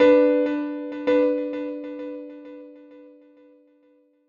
Hd piano

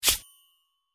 foot step

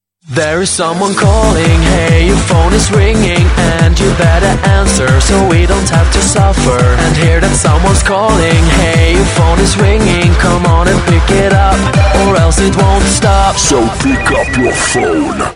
Bass Call Effect

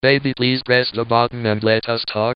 Baby Answer

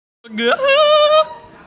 guheuuuuh

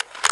Ammo Pickup; Quake 3

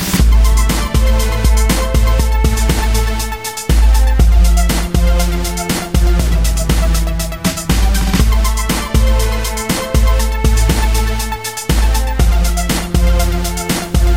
Hip Hop Ringtone

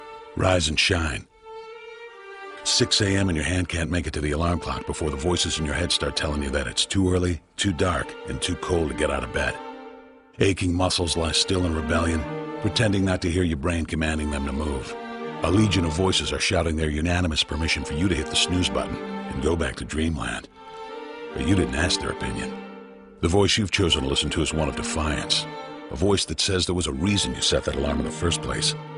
Rise and wake up

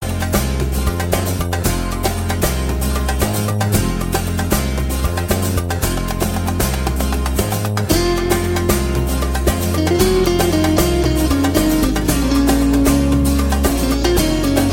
Turkish

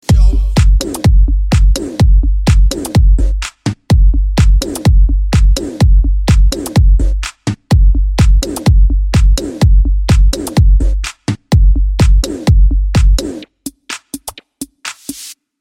Bass Club Mix

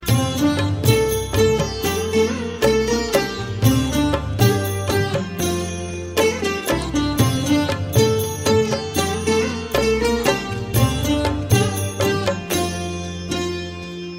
Sitar Manjira

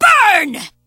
Burning Kick

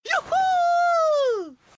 woohoo; Hifumi

